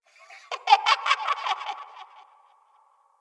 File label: Ambient Giggle; Sproutfolk; Bromius Quest; Neathuns